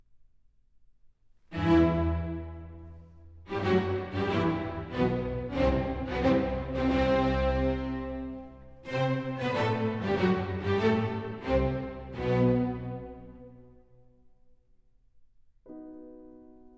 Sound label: Piano Concerto No 4 in G Major, Andante con moto; Beethoven